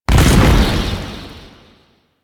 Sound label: Wraith Leap Jump Land; Enemies; Warframe; Zariman